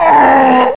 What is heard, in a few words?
human pain